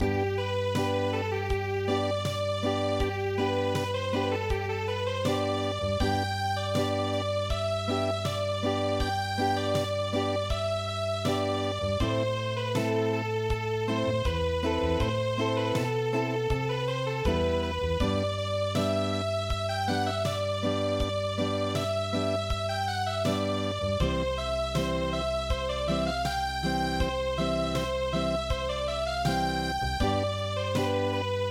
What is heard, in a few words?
Unplugged, midi; traditional